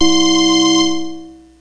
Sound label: C3 LAZER; proteus2